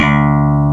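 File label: digital BASS; AKAI S6000 CD-ROM - Ultimate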